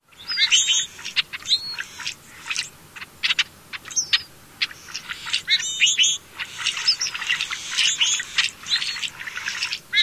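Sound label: group singing and calling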